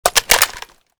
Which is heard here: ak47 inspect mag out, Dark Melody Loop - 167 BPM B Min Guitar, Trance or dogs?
ak47 inspect mag out